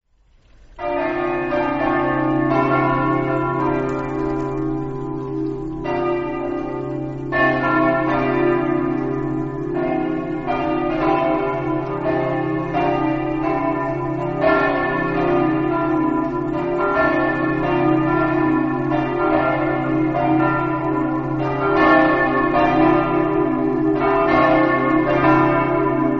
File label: campane; pordenone